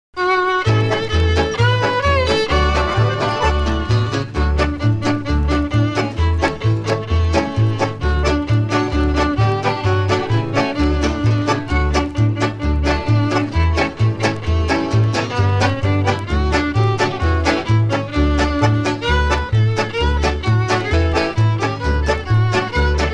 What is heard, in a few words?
instrumental